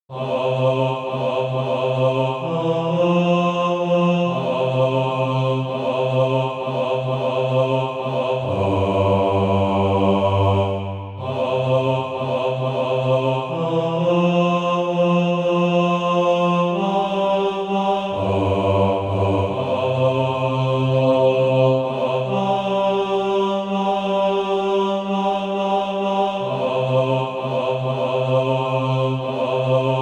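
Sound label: bass, SATB Practise Music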